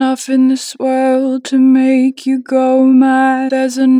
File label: Natural Vibrato, Vocals, Go mad, 2 bar vib; Harmor Vocals